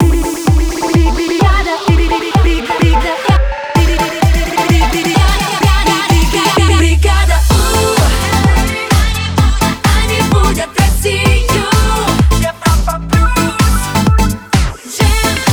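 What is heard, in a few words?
128bpm Main ID, jingle; EUROPA PLUS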